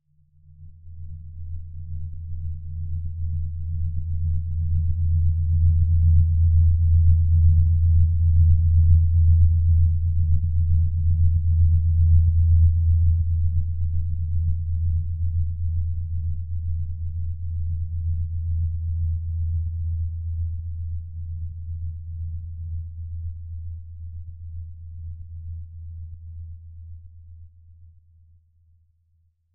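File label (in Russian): космический звук черная дыра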